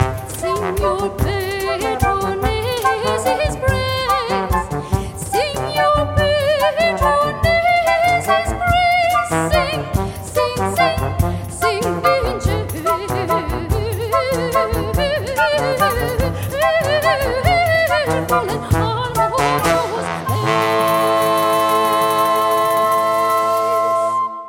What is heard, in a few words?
strike the viol